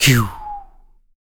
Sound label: PERC TOM, Male Perc, Vocal; Spectrasonics Vocal Planet; Jazz FX